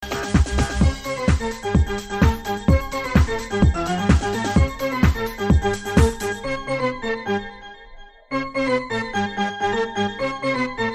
calypso

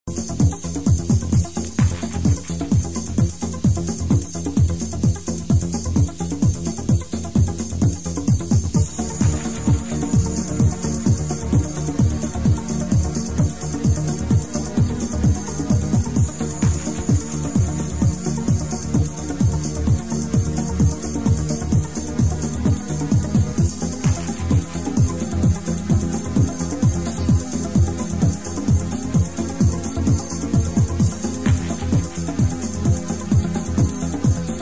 dreamy techno inst

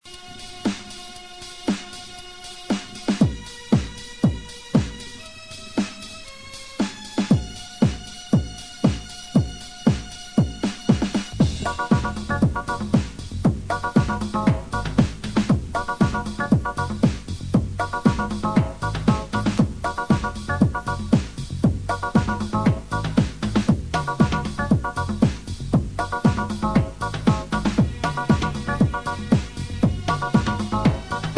rhumba organ

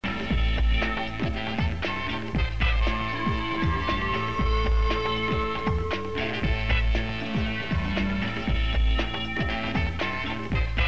jazz

